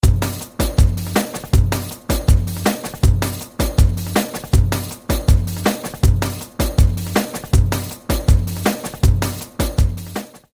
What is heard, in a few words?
Darbuka Arap